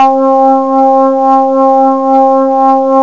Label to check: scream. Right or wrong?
Wrong